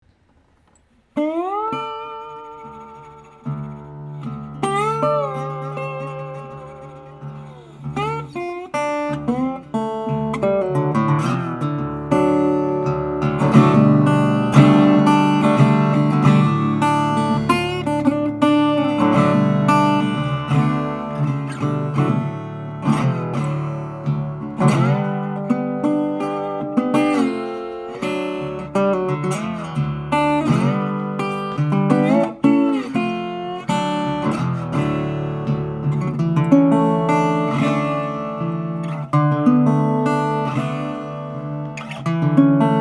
National M1 Tricone Cutaway Wood bodied Resonator Guitar; Organic Sounds; Select Guitars